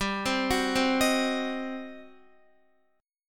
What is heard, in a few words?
G Major 7th Suspended 4th Sharp 5th, G, down, Guitar; GM7sus4#5 arpeggiated